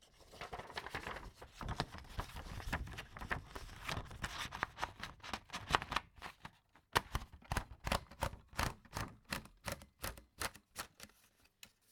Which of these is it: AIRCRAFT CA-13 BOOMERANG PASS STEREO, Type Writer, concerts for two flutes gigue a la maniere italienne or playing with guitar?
Type Writer